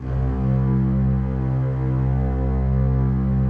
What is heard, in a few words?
PAD, DIGI-PAD